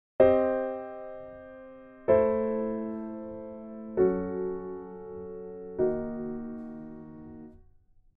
parallel minor m3

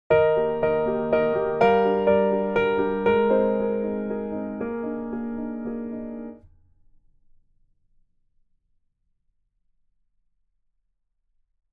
diatonic composition

